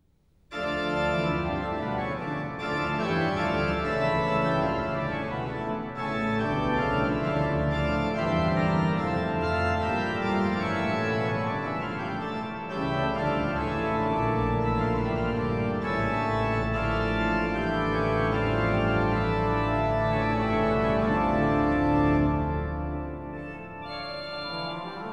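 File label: Prelude, organ; J. S. Bach; Piet Kee Plays Bach, Volume 3; Eight (short) Preludes and Fuges; No. 2 in D minor, BWV 554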